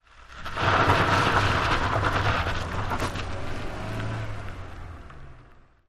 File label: Car Tires Gritty; Peel Outs, On Board Perspective 5x